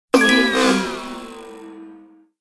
cartoon mechanic lost detune

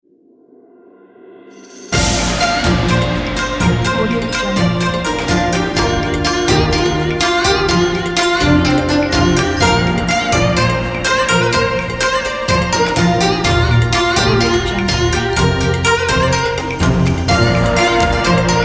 chinese new year

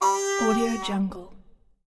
door creaking

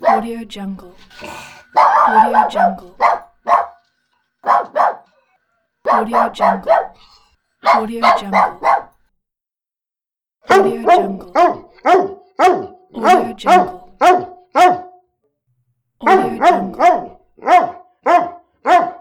dogs barking

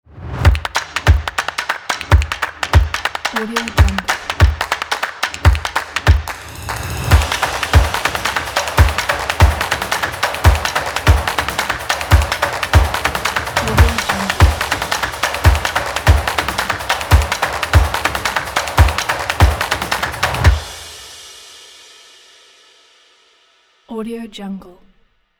fast drums intro logo; eliansproductions

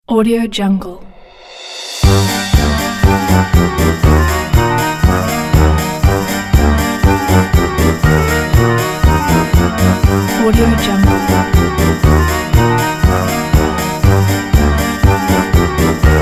halloween comedy

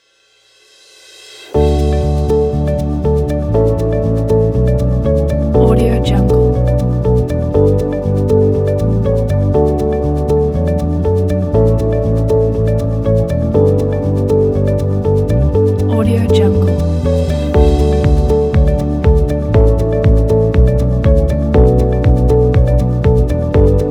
positive motivation

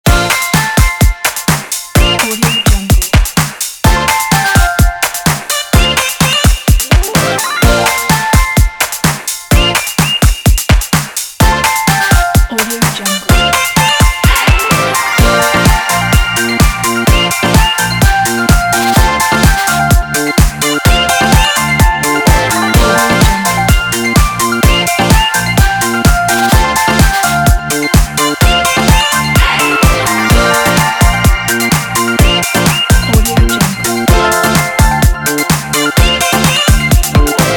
happy kids

